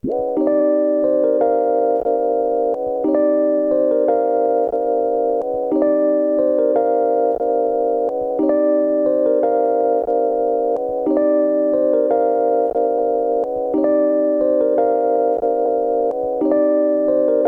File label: Tape Loop Melody C; Tape Loops; Melodies; Cymatics - Eternity Sample Pack